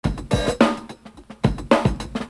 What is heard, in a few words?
Beat, Hip-Hop Street Beats